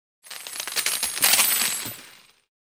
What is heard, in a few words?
coints music